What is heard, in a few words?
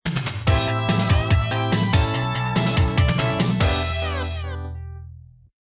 Before Combat BGM (Internal Sound + Mercury Unit Version), x68000; Asuka 120% Burning Fest.